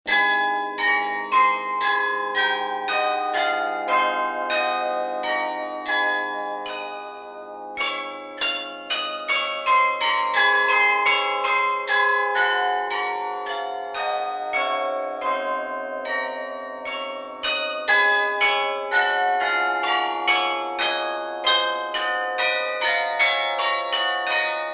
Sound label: cycle for carillon Solitery Bells; poetry impression by Jonas Mekas